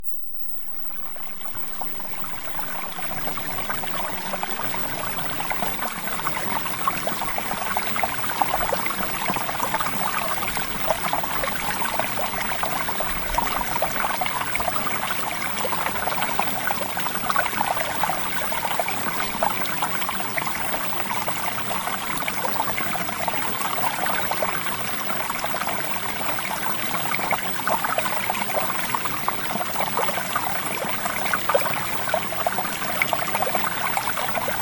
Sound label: Water; Premium Subliminals